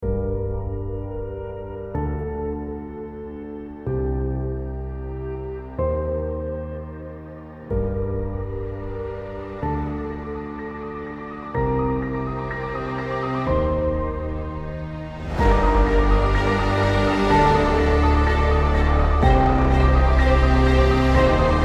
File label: Cinematic Trailer